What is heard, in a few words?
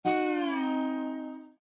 Windows Battery Critical; abbottabad compound